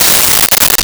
Crash; Nintendo; July 2020 Gigaleak

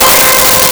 Saw Wave C5; Nintendo; July 2020 Gigaleak

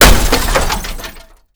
jeep crash hard

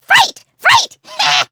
grunt; alphamoon

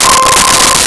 Chiff Lead C5; Nintendo; July 2020 Gigaleak

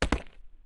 jump, footsteps hard surface

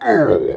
die, elite, involuntary, death instant; covy involuntary

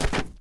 dirt land, footsteps; chief

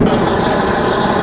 fire; Nintendo; July 2020 Gigaleak

